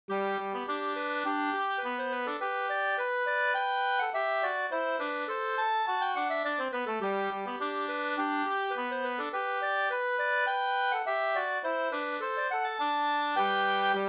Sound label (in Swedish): slängpolska